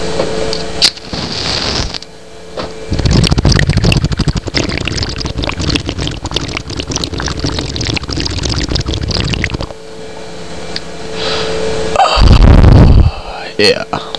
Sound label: bong hit